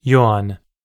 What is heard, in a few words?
yawn